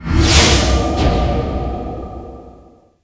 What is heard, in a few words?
Book